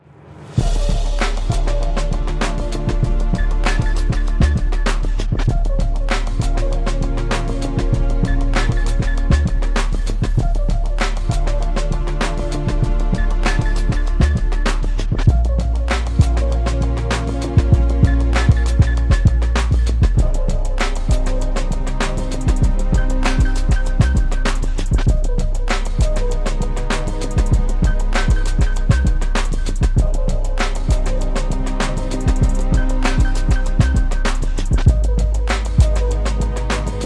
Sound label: Ringtone